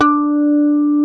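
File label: GUI Harmonic Flange D3, acoustic guitar; Best Service Gigapack 2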